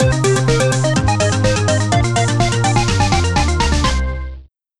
game over

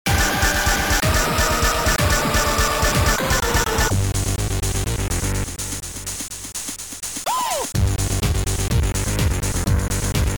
techno party

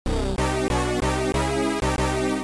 game over

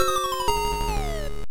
game fail

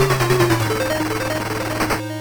death

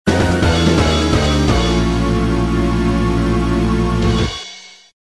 game over

